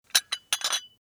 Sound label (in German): Espressotasse